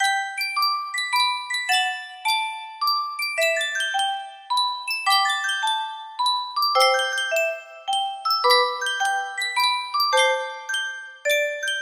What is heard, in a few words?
sankyo music box